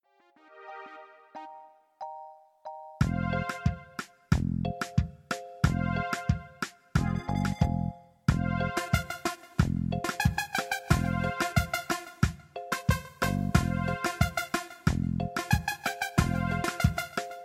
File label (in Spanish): Reggaeton, ritmos